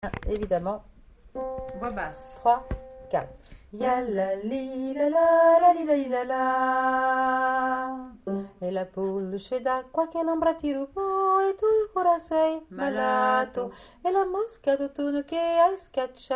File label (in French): basse; LA DEMOISELLE / PULCE D'AQUA